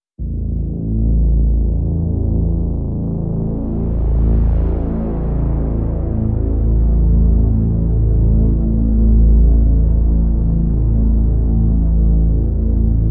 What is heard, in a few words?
Drn Bottom Floor A